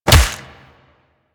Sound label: Melee Fist Impact Flesh; Warframe; Weapons; Fists